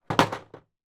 toy puzzle box down on surface hard; zapsplat

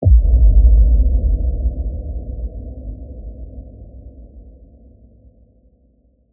Impact; PMSFX

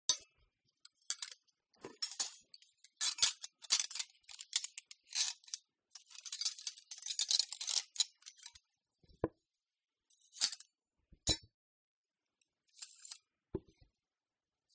Cutlery Setting On Table Handling; PMSFX

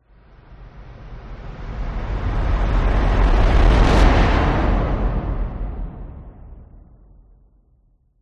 whoosh; orlando lanzini

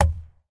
steering wheel tap person single; transportation; sound effects; sound effects one

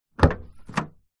car boot trunk door open external toyota corolla

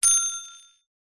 bicycle bell ring single gentle ping; vehicles; zapsplat

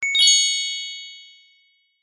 fantasy magic chime ping wand fairy godmother

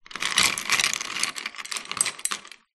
Ice Stir

